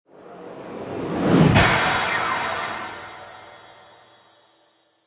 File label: Magical Projectile Whoosh Pass By Blast Sparkle Shimmer; Magic sound of casting spell, conjuring; Sparkle Sound Effects